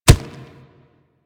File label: Kubrow Step Dirt, Footsteps; Enemies; Warframe